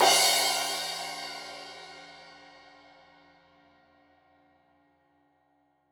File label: Crash 15in L edge, Cymbals Stereo